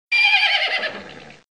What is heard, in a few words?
horse